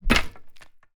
pike attack

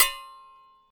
shield metal, attack impact

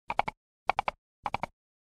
step, mounted movement

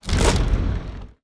onager hit siege

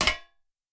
arrow metal, attack impact

